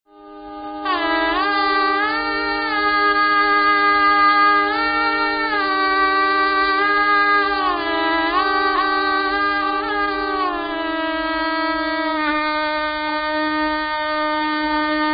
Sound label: shehnai